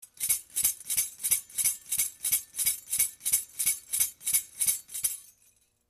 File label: Rattle